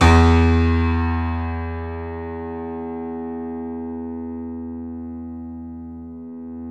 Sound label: KEY, YC7 Piano ff; Roland L-CD701; ff YC7 St